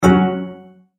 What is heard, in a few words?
multimedia tone exclamation; McKinney Sound